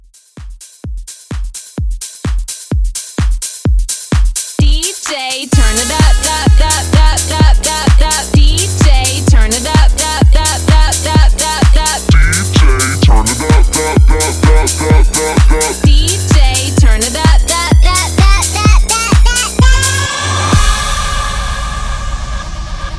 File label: house mixshow 128 clean